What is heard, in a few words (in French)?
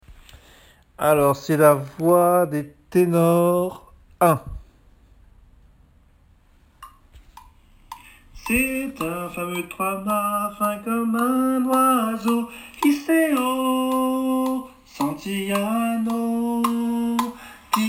Ténors